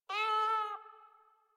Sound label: Kitten Meow Agitated; Enemies; Warframe; Catbrow